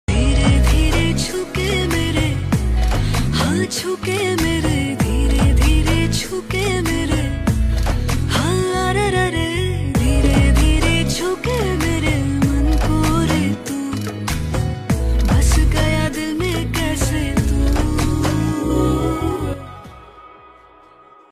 ringtone tamil ringtone bgm; ntr

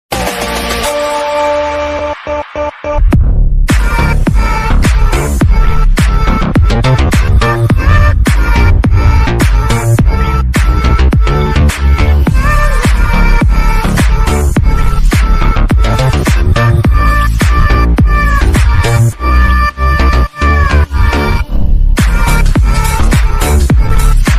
eagle theme arabic attitude ringtone

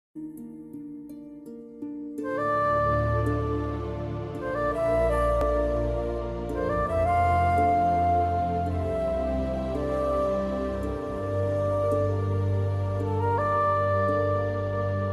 flute ringtone bgm; karthikeya 2

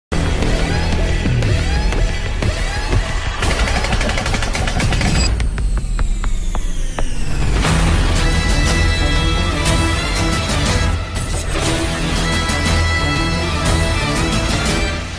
raavan bgm, bengali bgm; super stars jeet

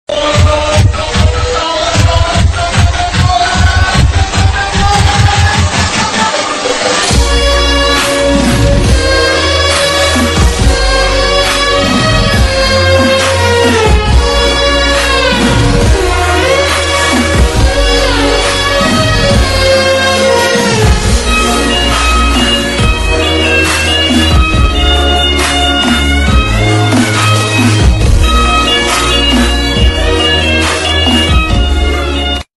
remix ringtone

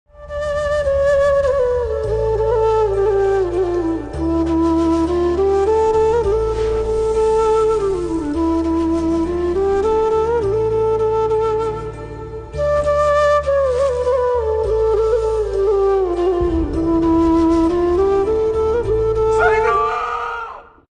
bgm ringtone instrumental ringtone; aadujeevitham

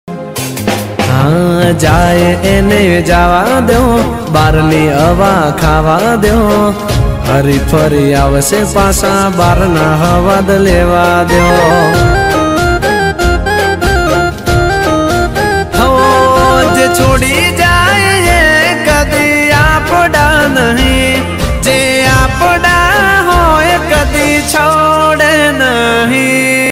new song ringtone new gujarati ringtone

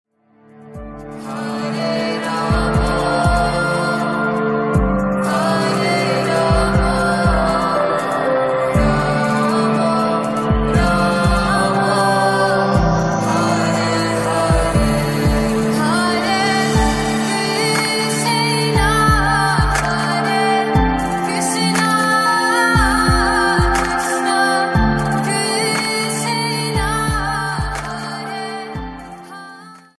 hare krishna hare rama bhakti ringtone; radhe krishna

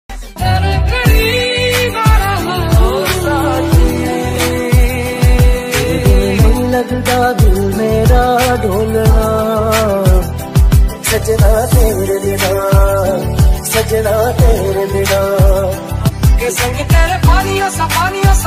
dj remix mashup ringtone

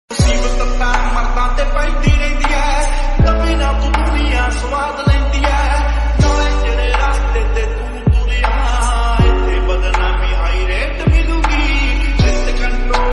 punjabi song ringtone

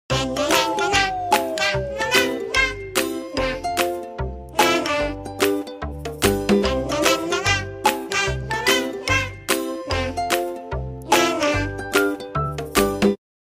samsung galaxy s21 ringtone

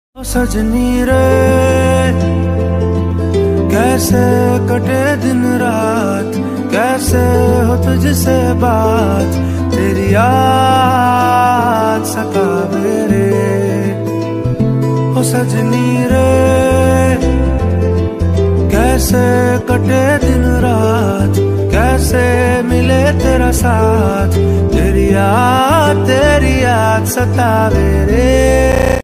romantic ringtone love ringtone

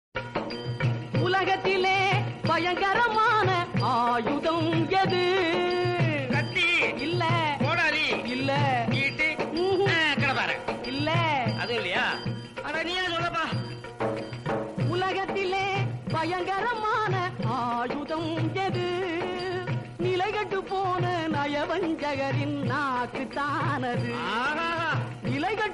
tamil ringtone; tatva padal

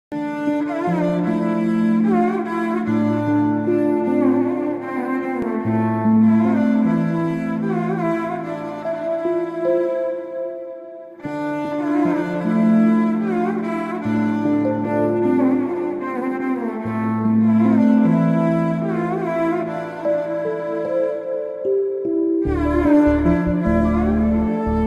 instrumental song malayalam violin cover

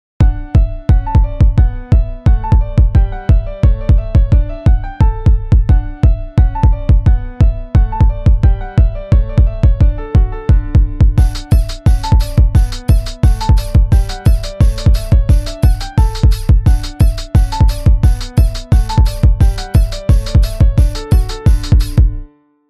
jersey club remix ringtone; samsung

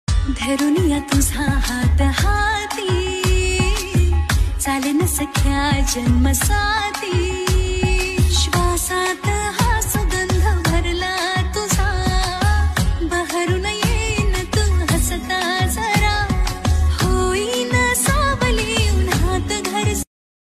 marathi ringtone song

